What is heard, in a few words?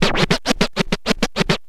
scratch short, Vinyl scratches